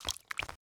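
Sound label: liquid dripping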